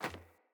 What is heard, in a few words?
Sandals Run Metal, Footsteps